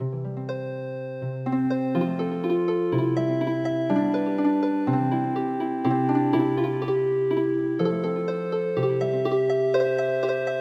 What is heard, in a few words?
Healing Harp, traditional